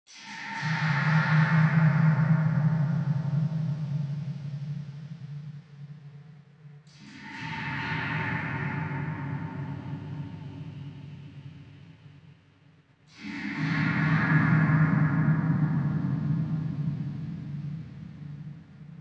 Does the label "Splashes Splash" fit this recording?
Yes